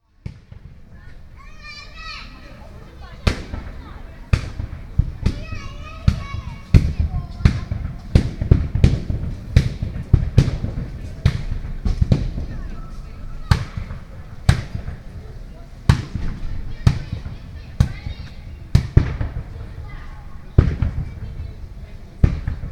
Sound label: beating